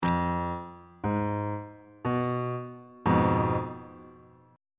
f diminished chord on bass clef

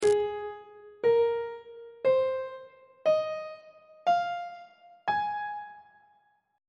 g sharp major pentatonic scale on treble clef

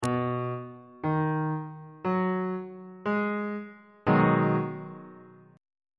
a flat minor 7th 1st inversion

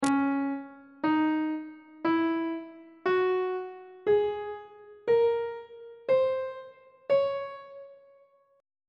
c sharp melodic minor scale on treble clef

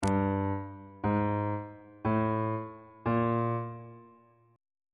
f 3rd intervals on bass clef

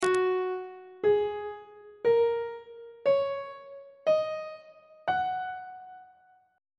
f sharp major pentatonic scale; on treble clef

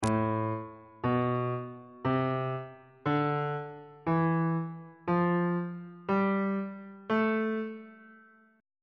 b double flat minor key signature

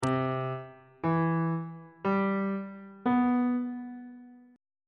dorian 7th chord 7 is c major 7th on bass clef

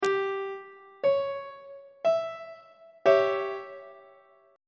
d flat diminished 2nd inversion on treble clef

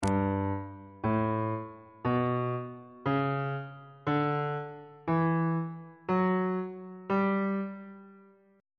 g lydian mode

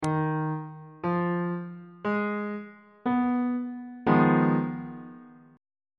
a flat minor 6th 2nd inversion

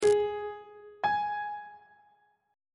a flat note on treble clef

